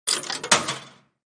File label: coin insert